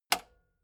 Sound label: metal button spring click fast